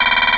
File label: TICK; LANDMINE